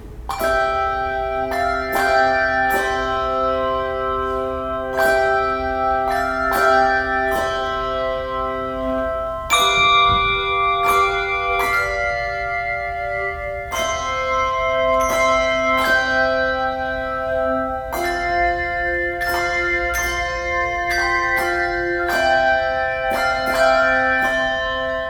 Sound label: Silent night using chimes second verse, the handbell choir; making-joyful-music